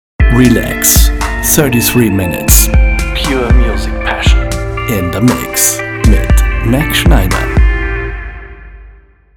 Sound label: Jingle